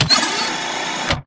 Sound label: Mvmt Servo Head pivot Long; AI Stalker; Titanfall 2